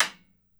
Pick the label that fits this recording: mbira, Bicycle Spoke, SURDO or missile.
SURDO